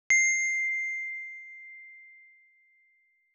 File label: bell